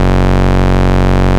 Ultimate Bass Root F#; Vengeance Samples

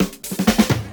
Fill Ins 128BPM; Vengeance Samples

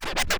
Cuts, Vinyl Record Samples

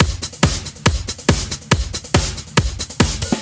Breakbeat 140 BPM

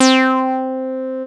Synth Sound; Vengeance Samples; VEE Synth Shots

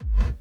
BD Gate FX, Bassdrums; Vengeance Samples

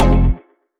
Synth Cuts; Vengeance Samples; VES1 Instruments

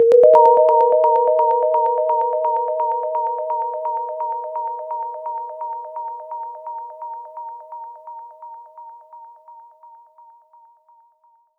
Special Sounds Root D# 128 BPM; Vengeance Samples; VEH3 Special Sounds

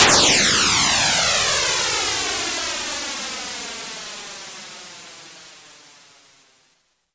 Downsweeps

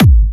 Bassdrums UK Trance Kicks; Vengeance Samples

